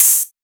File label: hat; Anthemic